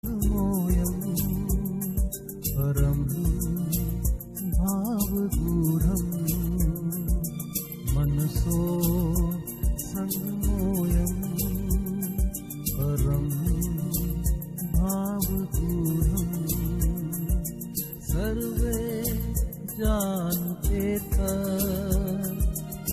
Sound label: sanskrit sad